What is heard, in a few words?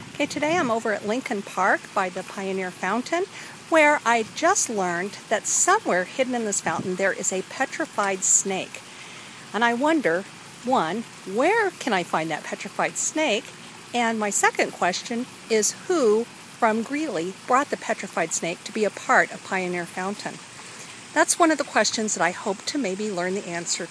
pioneer fountain in lincoln park